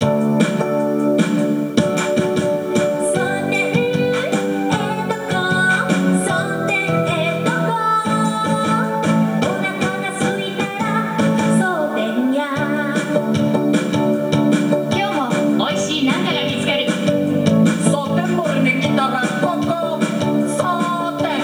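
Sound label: background music xmas; yakuza ryu ga gotoku kiwami 2 pc gamerip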